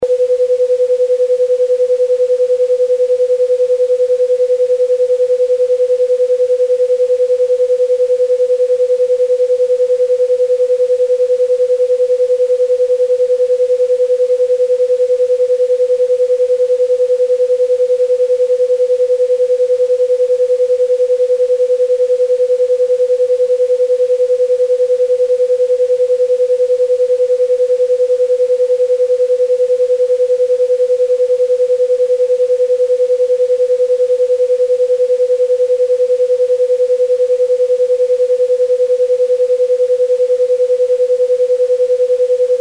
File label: alpha 10 pink noise